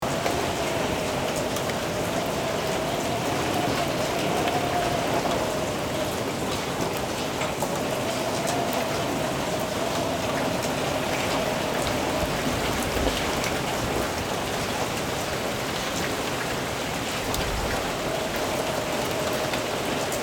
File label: Rain on farm barn roof pattering